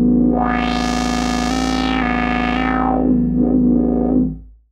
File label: Odd Modular; radiosonics